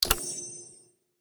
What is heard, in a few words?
Switch; SNKRX